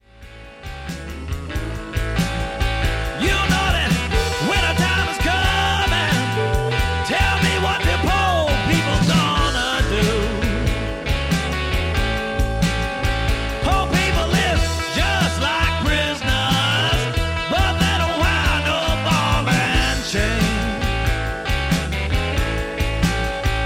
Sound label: Howling Wind Blues